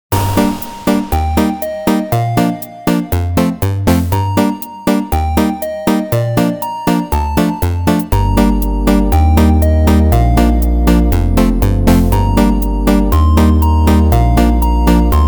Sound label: 8 bit arcade